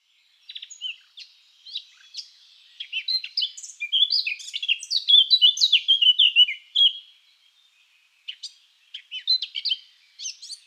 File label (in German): Moenchsgrasmuecke Gesang; Karl-Heinz Frommolt; Vogelphilipp; Vogelstimmen